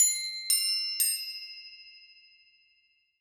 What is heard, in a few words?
Crotales CFE; Acoustic Ringtones